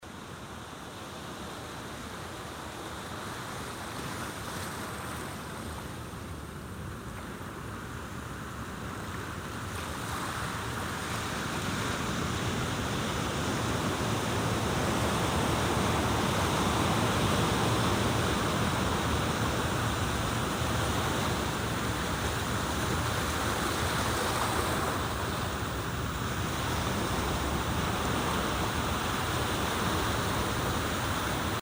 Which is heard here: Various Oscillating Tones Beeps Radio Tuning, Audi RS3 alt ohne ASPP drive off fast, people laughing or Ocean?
Ocean